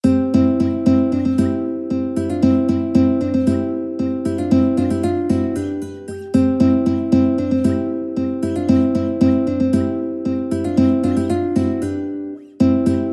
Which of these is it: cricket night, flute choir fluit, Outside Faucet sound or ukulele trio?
ukulele trio